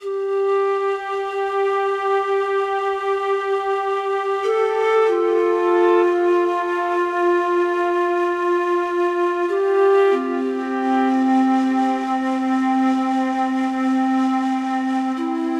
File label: Flute